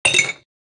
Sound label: glass